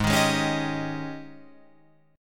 G sharp Major 7th Suspended 4th G sharp, Guitar Open F; G#M7sus4 Chord